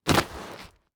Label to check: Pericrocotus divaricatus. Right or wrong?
Wrong